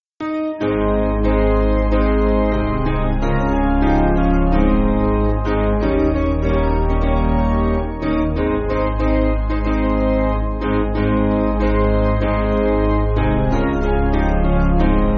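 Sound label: Piano, Backing Files for Churches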